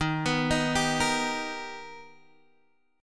B Augmented Major 7th Eb, chord

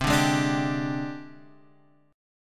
Ab Major 7th Flat 5th C

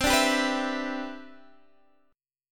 E Minor 7th C; Em7/C

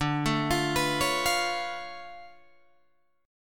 D Minor Major 13th, down, Guitar, DADGAD

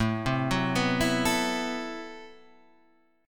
A Minor 6th Add 9th, Guitar, Standard; Am6add9 arpeggiated; 5,3,4,4,5,5

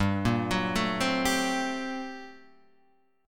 G Minor Major 11th, Guitar, Half Step Down

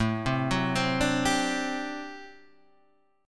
G Major 7th Suspended 2nd Suspended 4th A

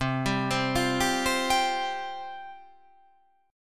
C Suspended 4th C

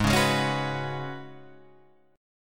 F sharp Suspended 2nd Suspended 4th G sharp

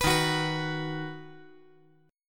Eb Minor Sharp 5th; Ebm#5 strummed; JGuitar

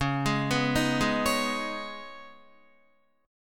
Db 7th Suspended 2nd Db, down, Guitar; Db7sus2 arpeggiated; tuning=4E,4A,5D,5G,5C,6F

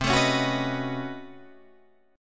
B 9th C down Guitar Standard; B9/C strummed; chord shape; JGuitar

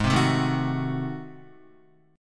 G sharp 7th Flat 9th G sharp down Guitar, chord; G#7b9; strummed; JGuitar

